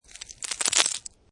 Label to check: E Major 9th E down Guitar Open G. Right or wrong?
Wrong